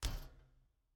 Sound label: Player Footsteps On Trash Can Land; Main Character